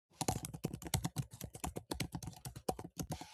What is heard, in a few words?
Keyboard